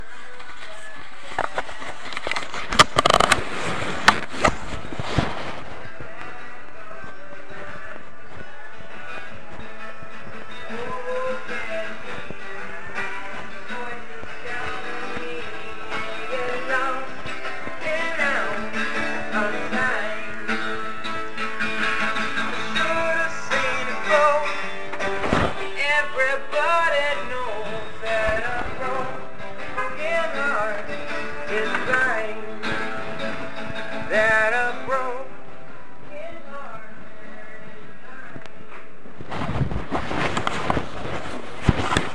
guy playing guitar outside grocery store; freshco

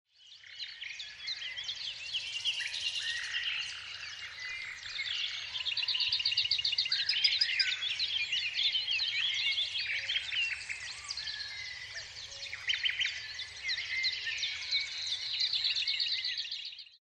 dawn chorus

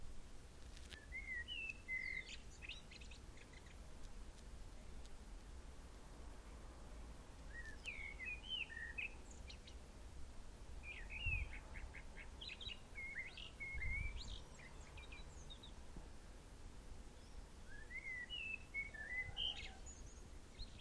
sunday morning birdsong

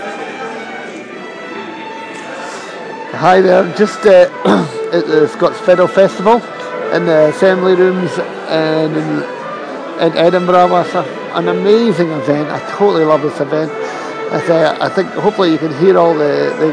scots fiddle festival

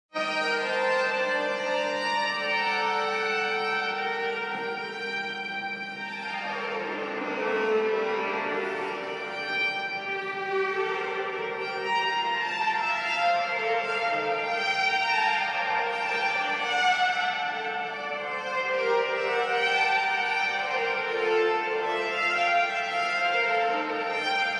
somerset house violin

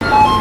phone ringing at dry cleaners; johnsons dry cleaners

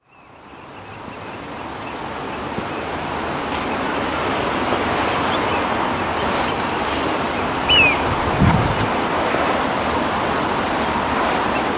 sea birds dogs at dawn

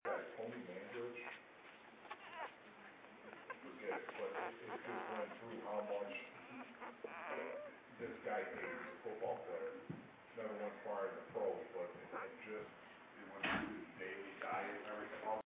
puppy

